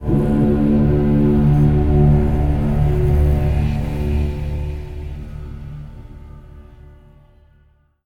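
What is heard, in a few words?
music heroes stinger; heroes of the storm full gamerip 2015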